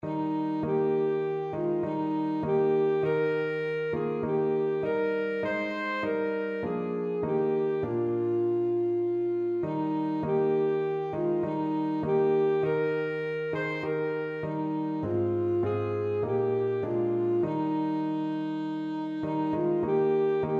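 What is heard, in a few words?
at the cross, alto saxophone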